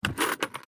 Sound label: Picking up metal scissors